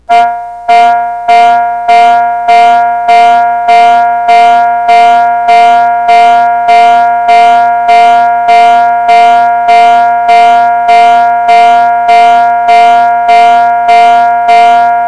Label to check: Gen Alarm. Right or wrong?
Right